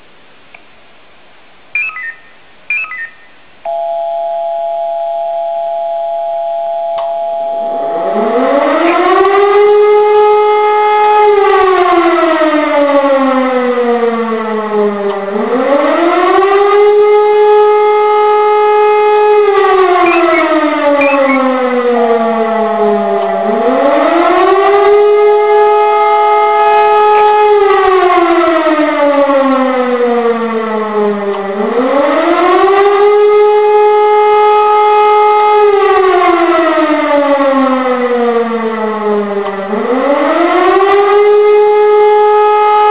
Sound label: alarm, zivilschutzalarm